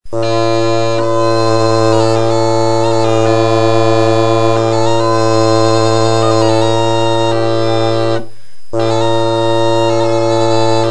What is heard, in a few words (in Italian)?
Urmofono Ancia Doppia